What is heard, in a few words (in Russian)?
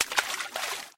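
всплеск воды после упавшего в нее камня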